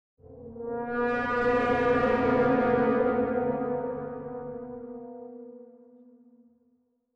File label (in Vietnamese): Am thanh nguy hiem dang den gan